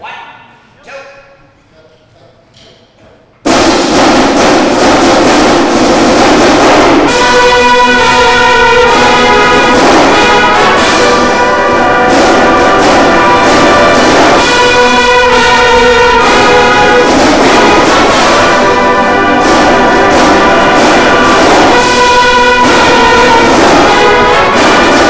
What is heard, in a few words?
Ensemble Run Through, brass; north star brass and ensemble